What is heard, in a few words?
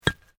PAVING STONE FALL; Material components for constructing more complex sounds; Drop Sound Effects; Drops (Sound Effects:Objects)